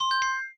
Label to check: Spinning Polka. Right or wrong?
Wrong